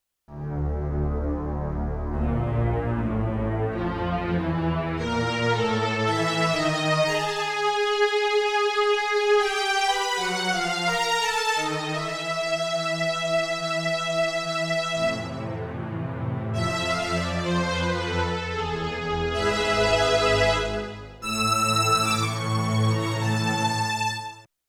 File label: String Ensemble; General Performance